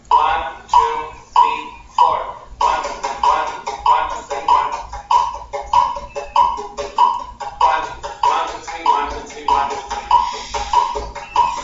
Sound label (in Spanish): Rasgueo 96bpm, guitar